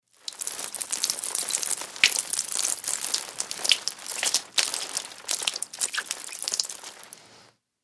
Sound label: Pour Water Floor Cls U, splashes